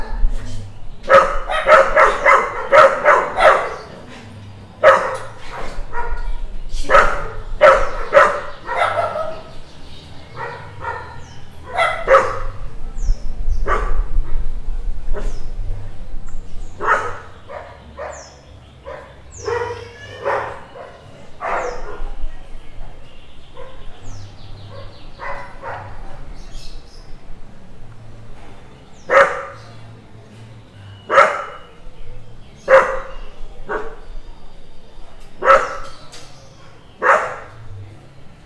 Perros Ladrando Barking Dogs

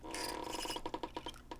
Old Lever Pull

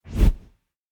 Whoosh Short

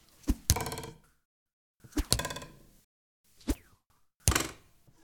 Single Arrows

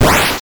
Kill Enemy 8 Bit

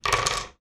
Board Start

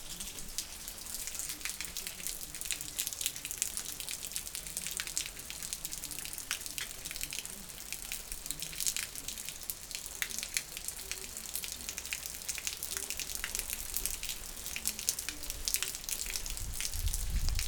Rain Gutter

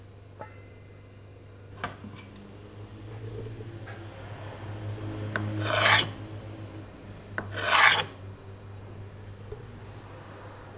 Kitchen Scrapes

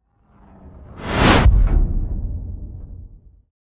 Cinematic Woosh

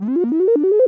Vintage Game Sound

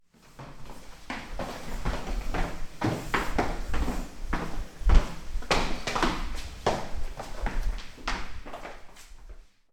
Stairs; Tanger

